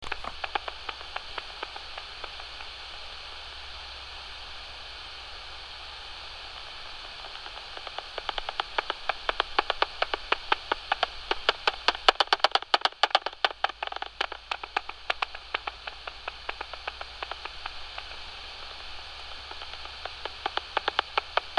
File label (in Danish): nordflagermus